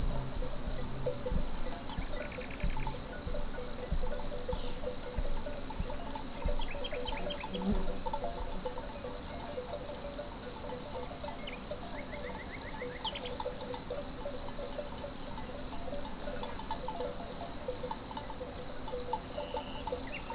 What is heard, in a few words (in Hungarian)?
cirip jobbra juhok egerszog, ret